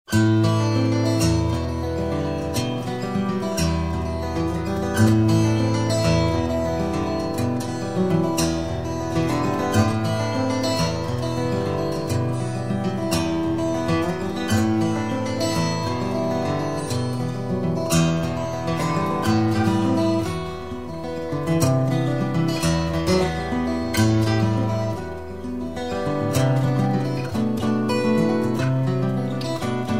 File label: acoustic